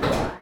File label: Thumper Rise